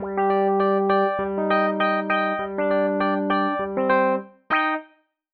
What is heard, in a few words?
Keys Line 100 G, 100bpm, hiphop; musicradar